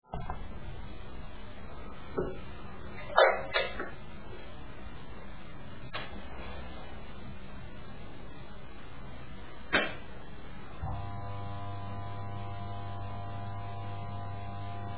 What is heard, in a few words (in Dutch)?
geluid, watertoevoer bosch wft 8330 stokt